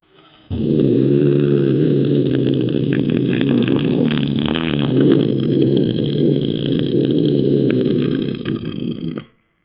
crowd murmur; low volume

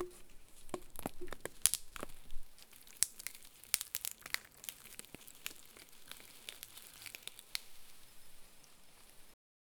plucking fruits from a tr

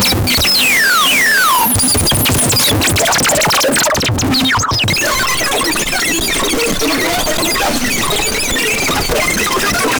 no words sound faint, phone

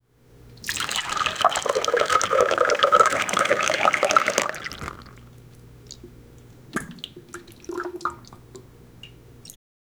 drinking water sound

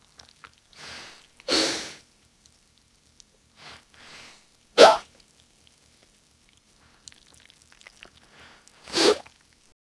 belly sloshing, gurgle

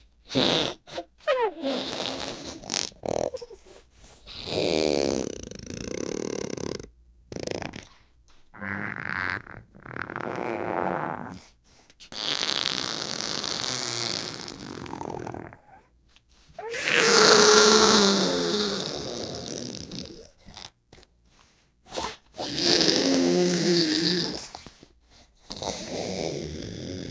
elastic young boy charact, stretching

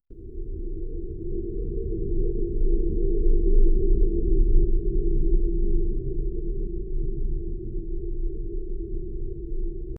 a large squishy creature, squish

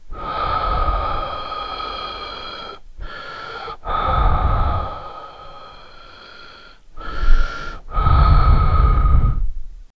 breathing hard

